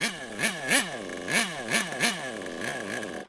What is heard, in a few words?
leisure toy rc car nitro engine rev still